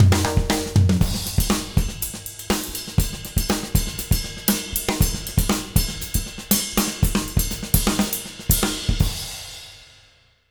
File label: 120 JROCK, Drumloops